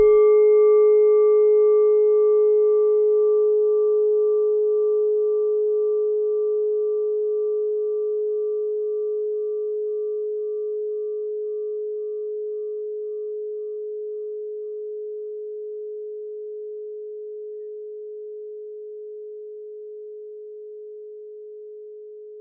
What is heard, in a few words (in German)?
klangschale nepal